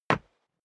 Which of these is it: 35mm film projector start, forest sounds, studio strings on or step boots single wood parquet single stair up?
step boots single wood parquet single stair up